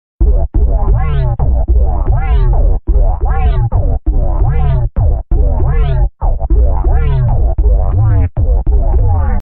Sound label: Samsung Bubbles